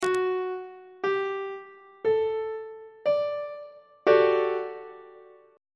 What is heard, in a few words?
f double sharp major 7th suspended 2nd 3rd inversion; on treble clef